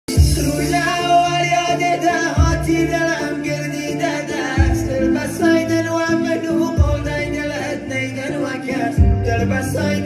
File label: love song duet